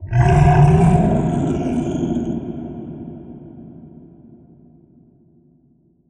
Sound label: monster spawn, kasatka; zoochosis; whale